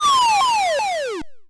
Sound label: fall